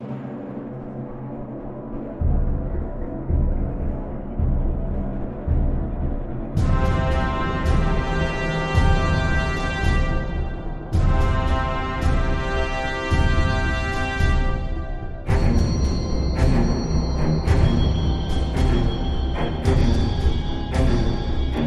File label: No Choir